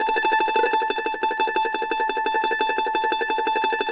MANDO TREM A5, Whole Lotta Country; Sampleheads; Whole Lotta Country 1 & 2

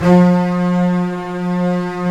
STR STRBS, MIDI Section; String Master Series; Combos 2

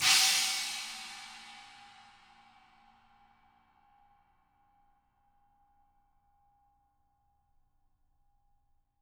CHINA CRASH; ILIO; Double Platinum Drums 1

